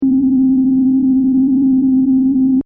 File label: Scifi SFX Loop, Short; Stuart Duffield